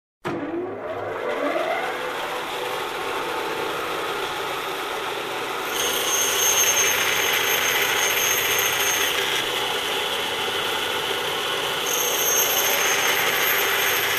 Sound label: Bench Cutting Machine